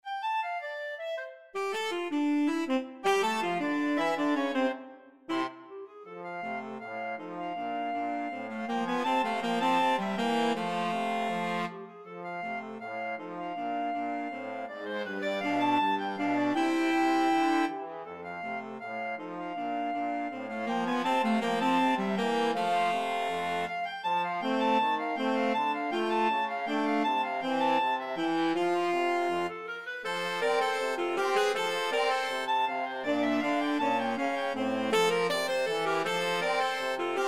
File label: flexible mixed ensemble, 3 players